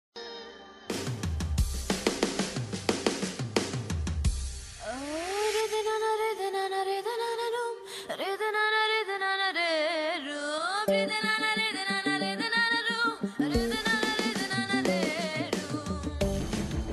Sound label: bgm track marathi serial; Zee Marathi; Adinath Kothare; Tejaswini Pandit